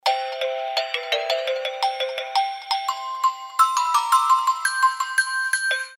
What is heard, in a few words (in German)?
aktion sms